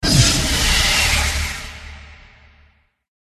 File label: boss buff; eldive